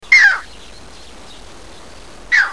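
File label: striated heron; sasagoi; toritori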